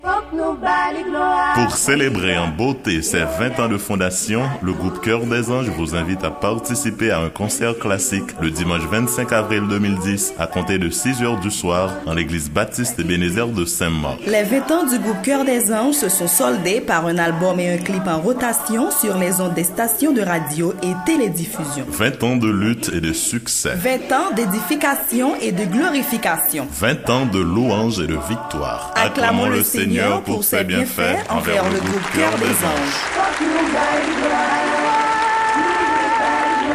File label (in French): CHOEUR DES ANGES; 20 ANS